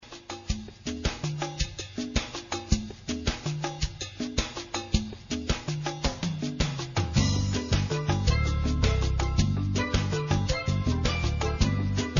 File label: Island Music; 529 Forest Way